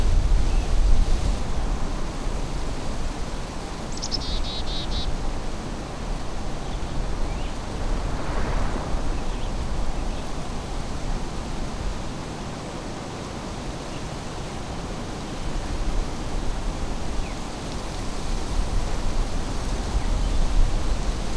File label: ASMR; CRT